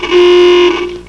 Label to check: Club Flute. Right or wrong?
Wrong